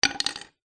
industrial hammer claw set down garage floor

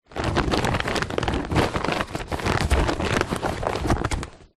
tarpaulin large sheet folded movements

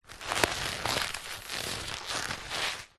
leather handbag or pouch handling creaks

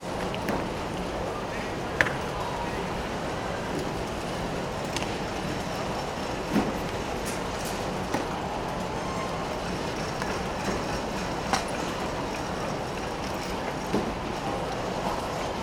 ambience airport stansted baggage reclaim; sound effects one

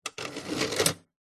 household dvd player disc tray close

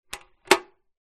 household tin jar teabags lid latch lock

